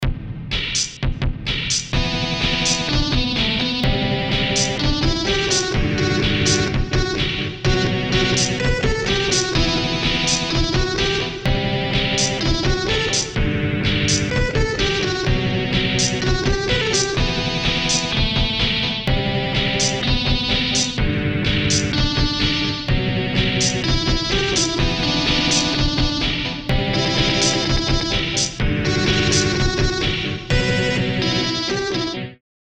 Sinewave

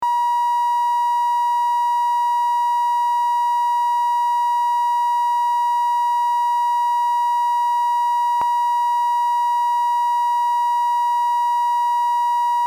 Sinewave

